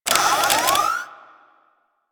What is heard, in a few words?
Security Camera Start; Ambience; Warframe; Grineer Fortress